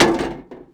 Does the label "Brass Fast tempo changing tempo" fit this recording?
No